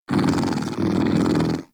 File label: Kitten Growly Purr; Warframe; Catbrow